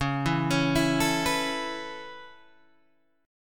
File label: Db Minor 7th Sharp 5th Db down Guitar Open G